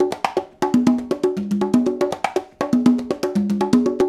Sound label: LOOP, Percussion